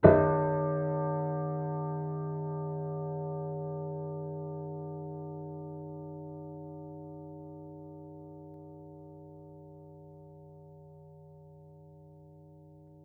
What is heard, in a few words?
Piano Harmonics